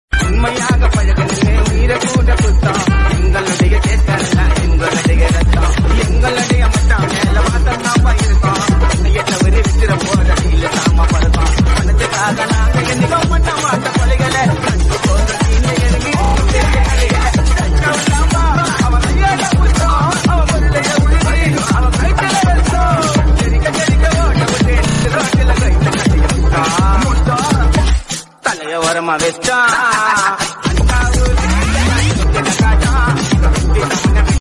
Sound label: tamil remix gana songs